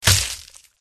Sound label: sea monster attack contact up